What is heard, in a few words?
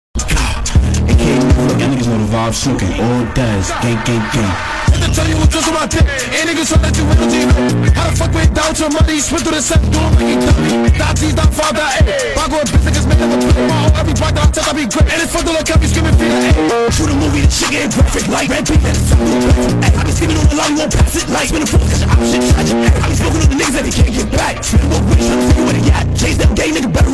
bassbooated